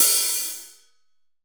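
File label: hi hat; HH-Kits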